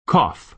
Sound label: cough